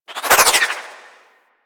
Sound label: Dodge Roll Left; Movement; Warframe; Archwing